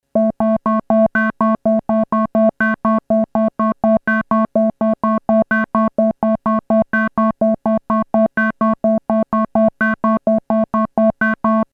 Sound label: filters detuned; obx; vst audio